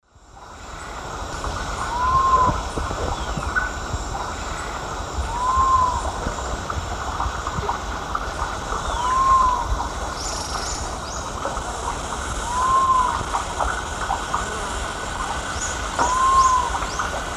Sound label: Geotrygon saphirina, song; S. America; Eastern Lowlands